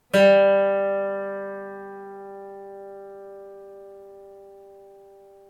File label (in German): Ton